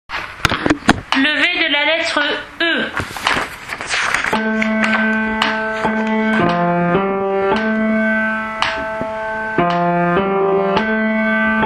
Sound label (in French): Brahms requiem allemand soprano levee de la lettre E; mesure 128 : levée de la lettre E